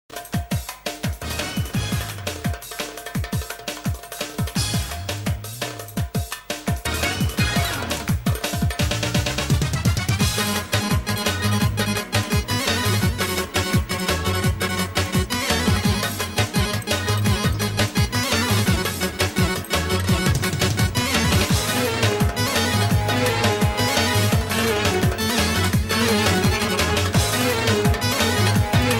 Balkan Remix

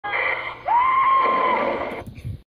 Screaming

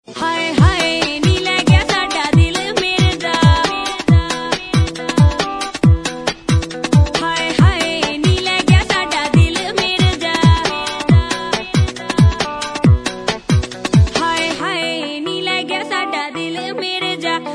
Punjabi

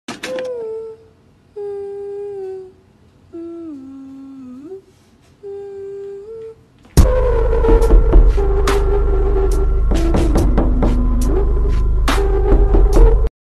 knock sound

